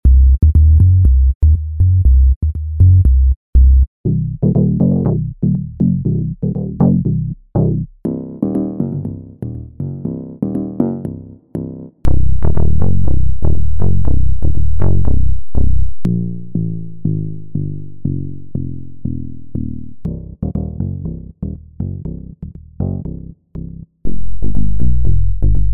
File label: blue bass bank